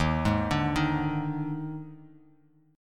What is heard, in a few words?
D sharp Major 7th Suspended 4th, D sharp, down, Bass Standard; arpeggiated; D#M7sus4; JGuitar